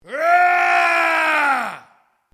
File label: MALE ROAR AGGRESSIVE SHORT; male vocalisations; Yelling; Voice